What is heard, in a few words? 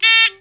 beep